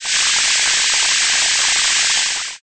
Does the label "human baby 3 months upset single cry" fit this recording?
No